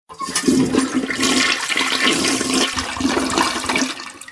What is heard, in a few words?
toilet flush